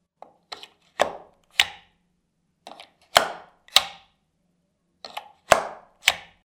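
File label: socket making sounds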